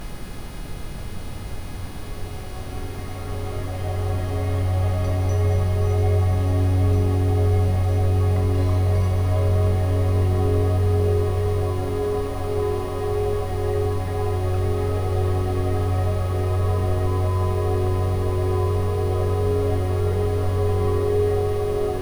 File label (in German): Rife Wellness Fluestern mit Ambient Sound; rife frequenzen